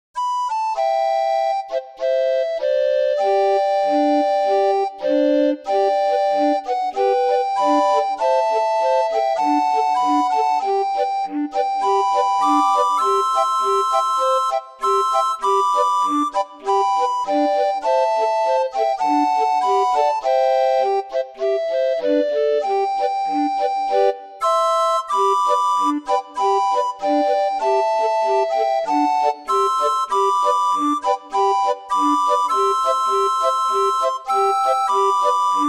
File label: Auld lang syne, orgue barbarie